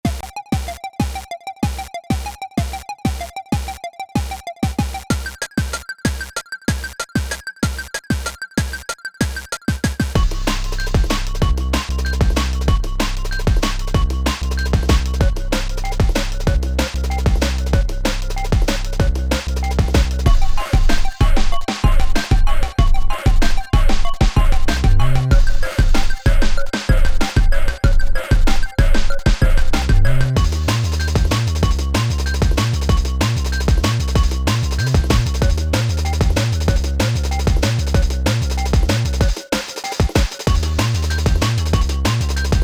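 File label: instrumental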